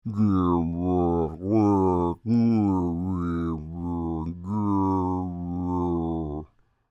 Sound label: Creature, Human, Speak, Groan, Long; Groaning; Voice; BigRoomSound